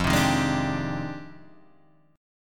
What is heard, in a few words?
F Minor 13th, Guitar, Half Step Down